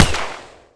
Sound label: smg fire; turcotte